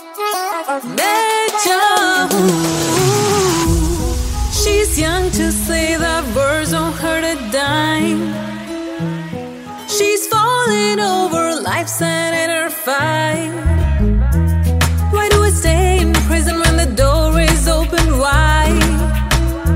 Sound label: music ringtone bollywood ringtones